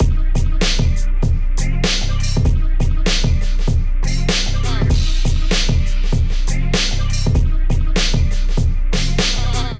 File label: Kit Groove 90 BPM, Drums; MIDI Clips; Ableton; Chop and Swing